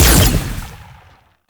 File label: Fire Shot